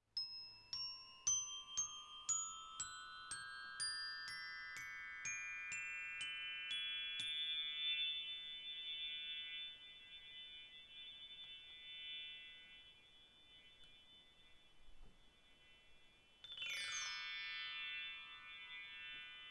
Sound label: xylophone